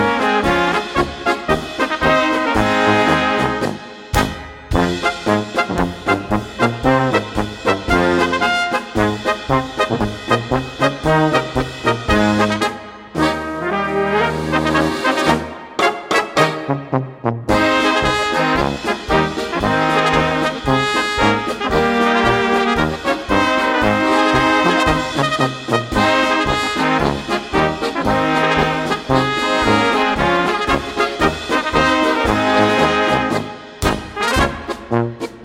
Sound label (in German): Polka